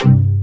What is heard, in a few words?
VOCODER DRUM